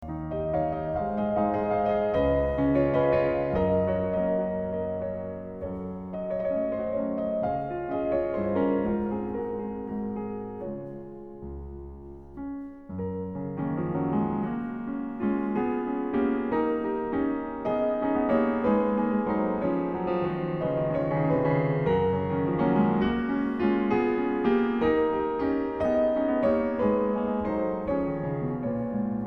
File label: Waltz in Db Major